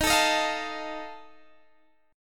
D sharp Suspended 2nd Flat 5th

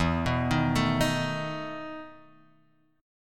E Major 7th Suspended 4th E down

